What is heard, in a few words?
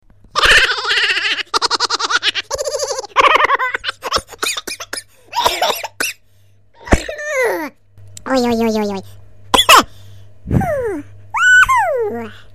chipmunk laugh